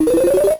Item Pop; SMA4

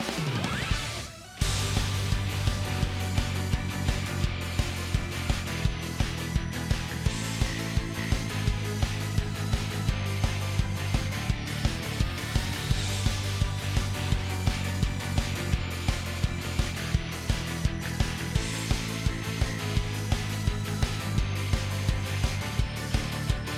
Instrumental

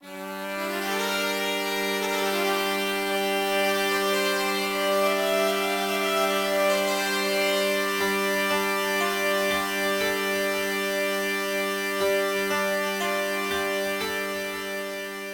Microgame music

